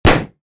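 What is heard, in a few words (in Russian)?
шарик лопнул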